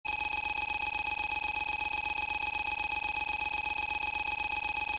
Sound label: beeps